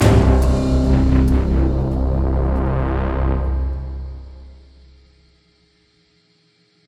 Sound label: Combat End; Onboarding